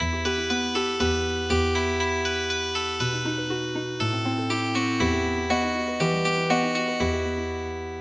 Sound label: Healing Harp